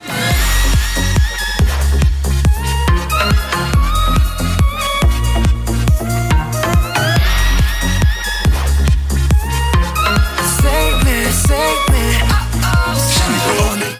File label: Save me beat drop